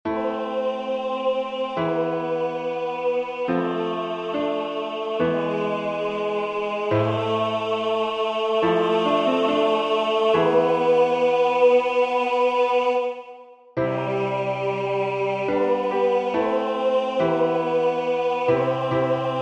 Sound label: Adoramus Te Christe tenor